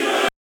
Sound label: choir